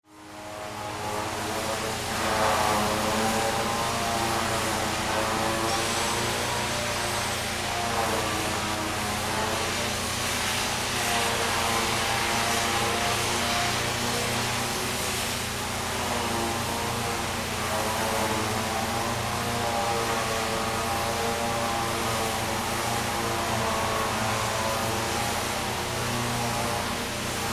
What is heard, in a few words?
Industrial Factory